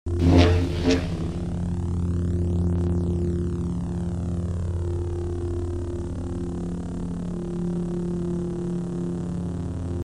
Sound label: Saber; FXHome